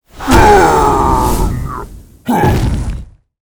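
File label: ice guardian death, monster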